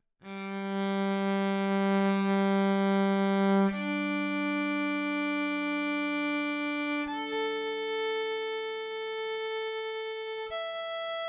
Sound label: Vln Pickup Single Treble midway